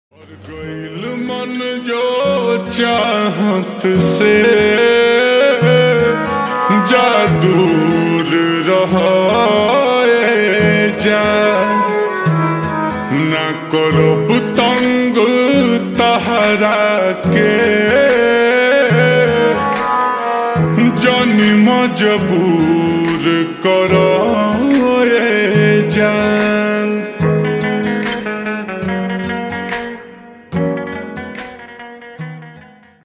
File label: Slowed Reverb Ringtone